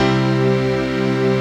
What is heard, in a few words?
CHRD PAD; Best Service; XX Large Pads; Sample CDs